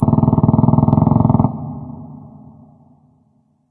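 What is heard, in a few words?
distant machinegun; random amb sfx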